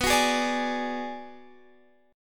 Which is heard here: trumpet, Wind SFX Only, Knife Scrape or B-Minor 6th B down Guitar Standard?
B-Minor 6th B down Guitar Standard